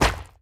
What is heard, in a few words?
bounce small; fistula